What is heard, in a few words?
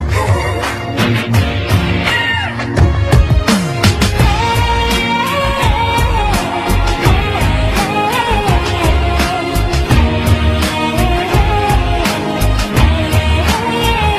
beautiful chinese ringtone